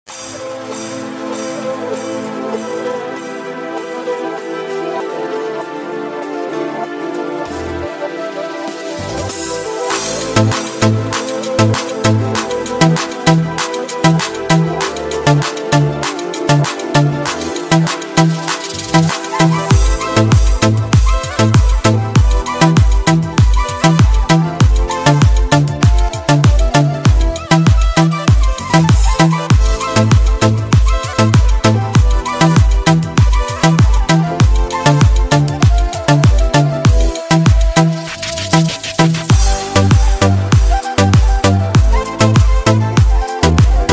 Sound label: arabic remix songs, Arabic Music; TikTok Viral Song Remix Music Car Bossted Song